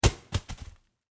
Household Paper Towel Package Drop Set Down Wrapper, kitchen